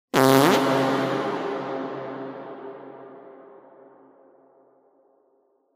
farting on mic

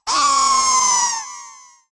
duck sound

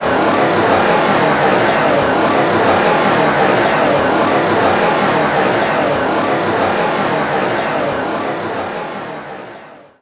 crowd raucous

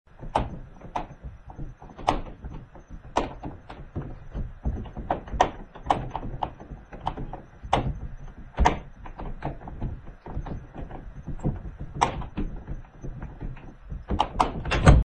aaaaarrgghh

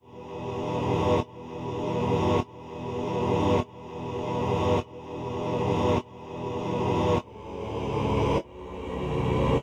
loop

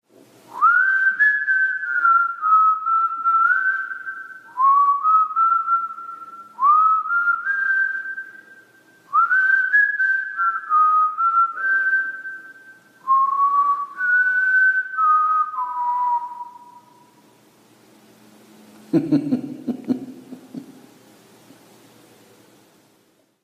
whistling man

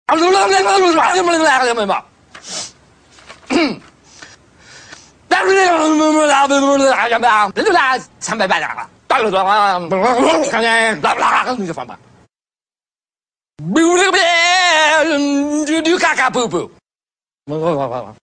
crazy cavern men

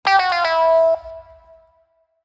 descending

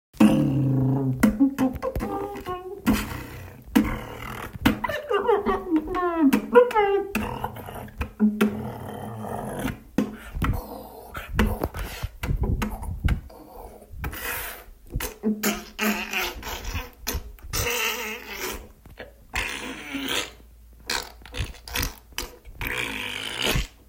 cool beatbox

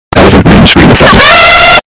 elephant

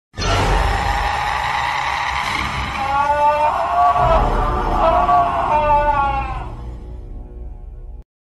scream; slendrina

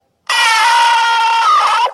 alien scream; fnaf 2

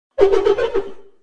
chuckle

